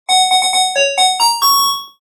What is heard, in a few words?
Game show winner bell sound effect